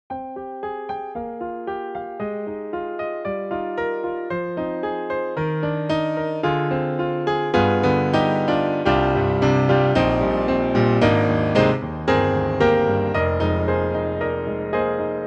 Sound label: concert grand; IK Multimedia; SampleTank2.5XL; Concert Grand Lite